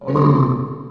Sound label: ghoul die